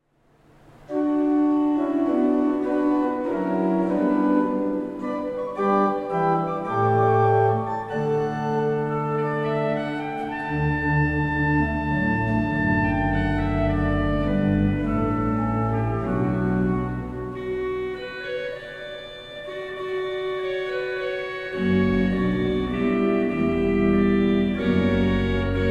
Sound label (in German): Orgel, pfeifenorgeln